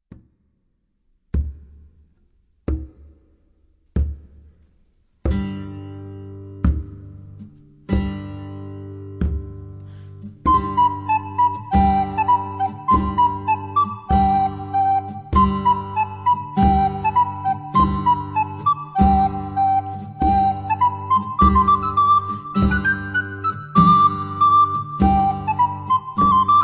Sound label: gavotte